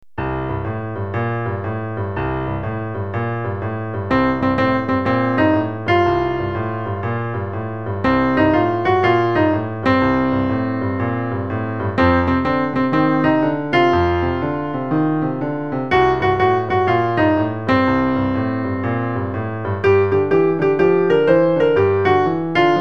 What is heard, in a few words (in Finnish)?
Blues pianolle